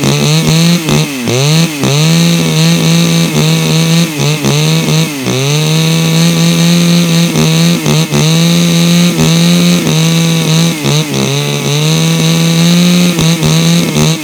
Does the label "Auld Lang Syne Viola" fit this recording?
No